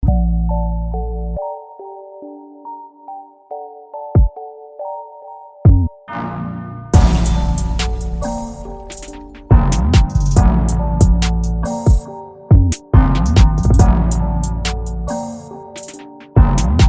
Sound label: drill trap beat 140 bpm